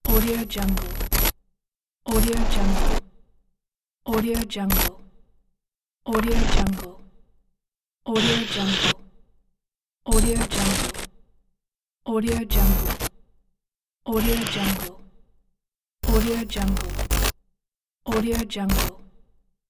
glitch

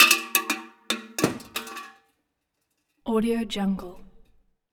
metal small object hitaway; matter mayhem; soundmorph

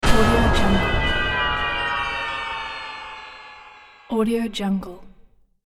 chaos bell spell

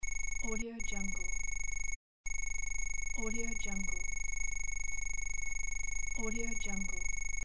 robot scanning

